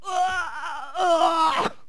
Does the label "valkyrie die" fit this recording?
Yes